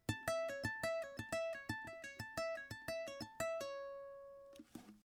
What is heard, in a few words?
Tapping with Pull Off A major, ukulele